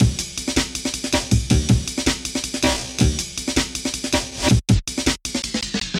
Amen Break B 160 Bpm

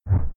Slide Whoosh

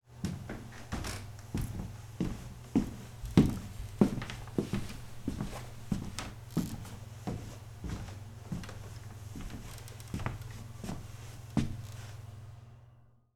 Footsteps On Wooden Floor

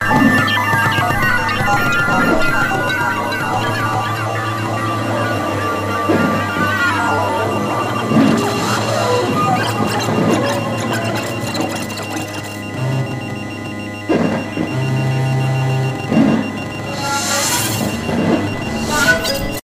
Electronics Sounds

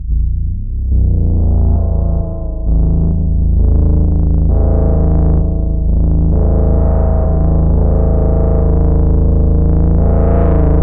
Deep Working Robotic Machine

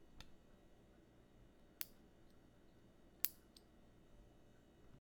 Button

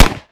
Snub Nose 357 Magnum; Noisegate

